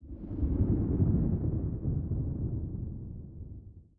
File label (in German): BARRIERE AMBIENT